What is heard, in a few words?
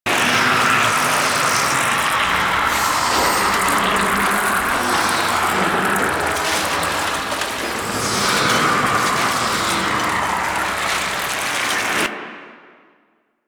Vial Hazard Dissolving Loop; Entrati; Hazards